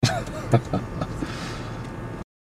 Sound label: haha